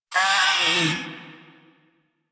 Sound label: Feral Vocal Death; Warframe; Catbrow